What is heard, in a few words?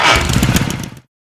vehicle moto shiftout; mohaa